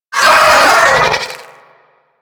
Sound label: Sentient Vocal Pain, Enemies; Warframe; DE; Sentients; Ortholyst